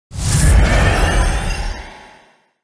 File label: blood vial